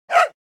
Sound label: dog bark